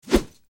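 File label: Medium Speed Air Punch, whooshes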